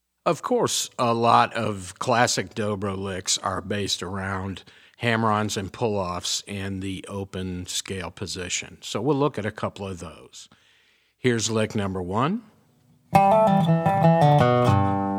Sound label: resonator pedal, steel guitar